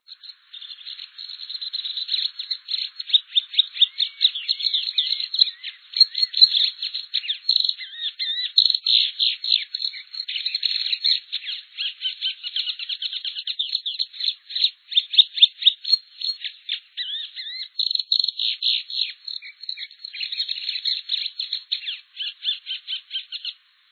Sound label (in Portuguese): patativa-verdadeira